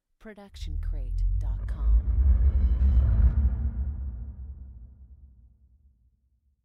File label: tense entering buildup; soundscrate